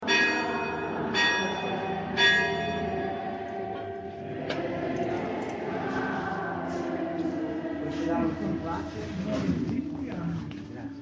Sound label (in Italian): Campana